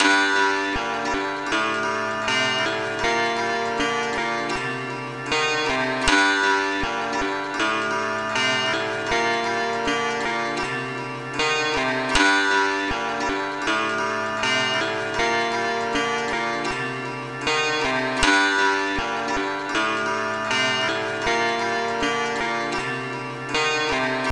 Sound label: 158BPM